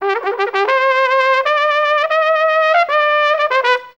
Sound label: TRUM, Gipsy; Best Service; Ethnic Journey Sound Cube